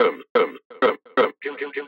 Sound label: VOX LOOP